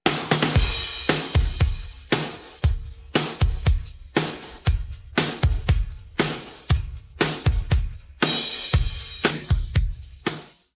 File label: Drums